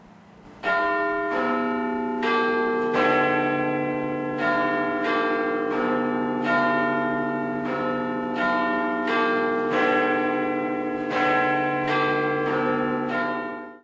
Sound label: big ben bell